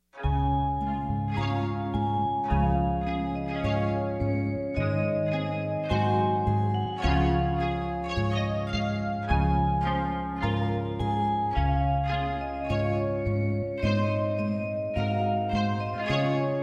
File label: vib; newyear 2011